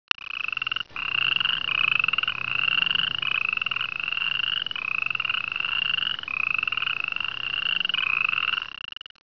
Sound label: frog sound